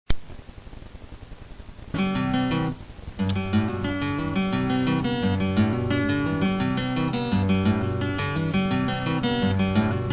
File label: Instrumental loud